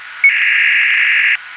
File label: GW 20 tone; GLOBE WIRELESS ADAPTIVE; GW-modem in 20-tone mode